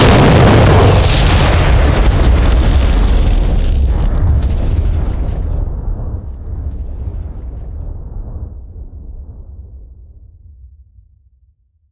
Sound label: Explosions Explode Ground; DCS